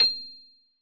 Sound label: Piano Ff